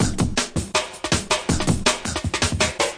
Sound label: frenzied, drum loops and breaks, 161 bpm; 161 to 180 bpm